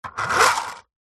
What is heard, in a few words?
leisure toy lego car push wood lift wheels spin air